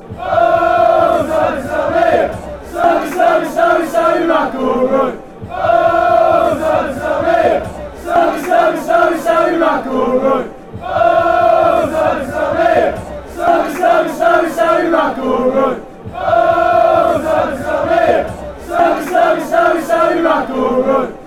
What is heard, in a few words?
fanchants; sammy mcilroy